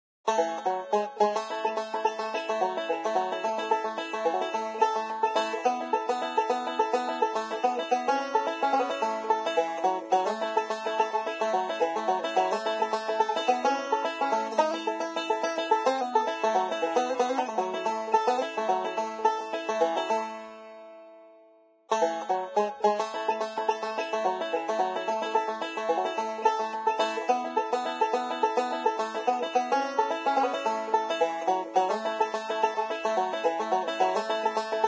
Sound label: Banjo; Zomboid; Lifestyle